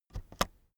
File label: keyboard external space press slow soft